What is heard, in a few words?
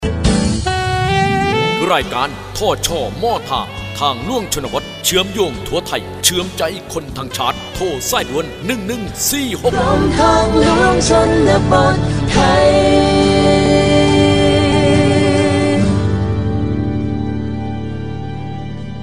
Jingle; ภาคใต้